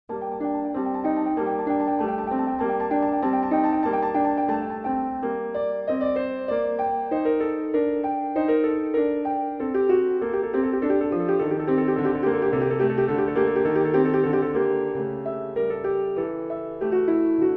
Prelude in G Minor; BWV 861; Bach; Well-Tempered Clavier; WTC I

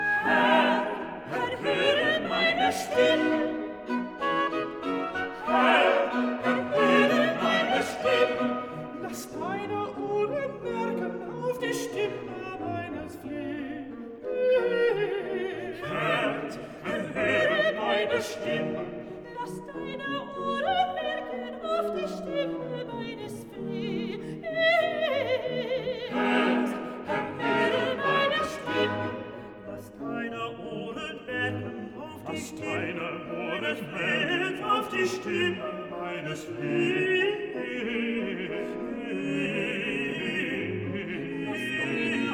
SATB; Bach; Early Cantatas; Aus der Tiefen rufe ich, Herr, zu dir; Herr hore meine Stimme

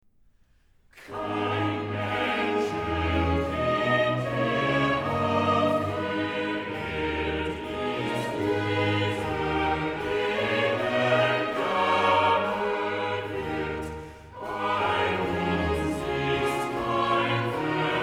Chorale; BACH VOCAL; CANTATAS; DGG; Wer mich liebet, der wird mein Wort halten

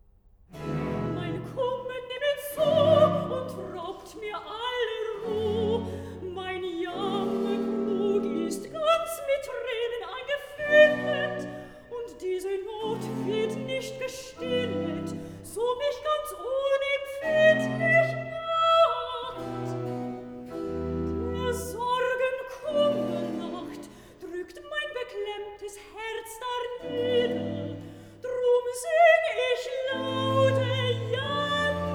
Recitativo (Sopran); vocal; soprano; BWV13; Mein Kummer nimmet zu; BACH

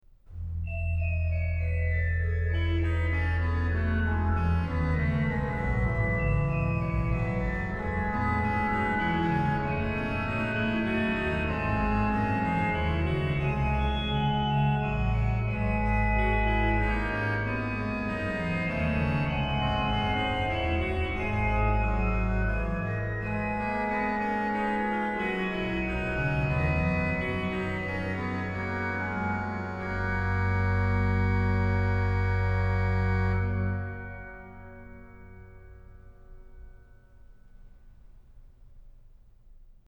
organ; BWV0619; Christe, du Lamm Gottes; BACH; BACH ORGAN WORKS; CHORALE PRELUDES

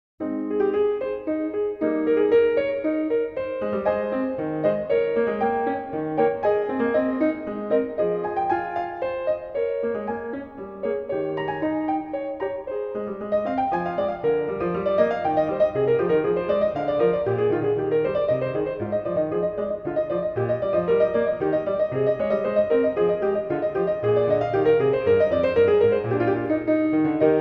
Prelude in A-flat Major; BWV 862; Bach; Well-Tempered Clavier; WTC I

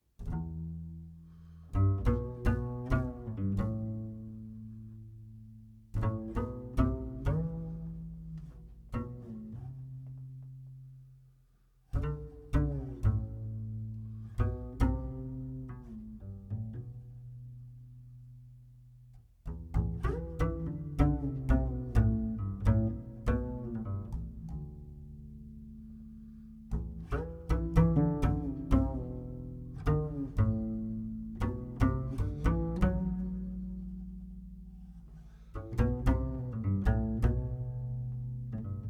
Bass Transition, Quartet; jazz

